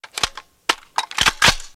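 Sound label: Machine Gun Cartridge; bursts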